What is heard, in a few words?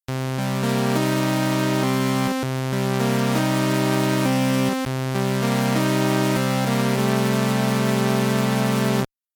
sawtooth wave